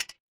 glass ceramic

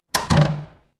door creak

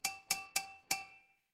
glass glass tap

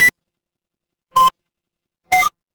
ui countdown

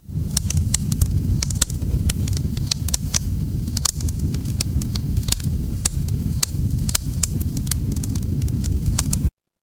ambience fireplace

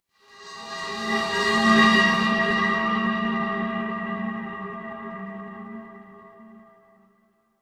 horror stinger

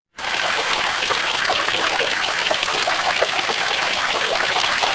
water stream

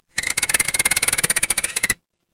mechanical gear

